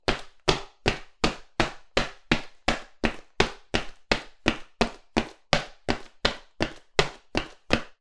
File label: Footsteps Male - Boots Lino Brisk